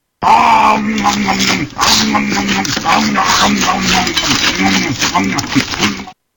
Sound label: Om nom nom nom